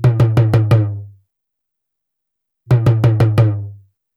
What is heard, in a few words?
ETHNO EXTRA 90; ethno2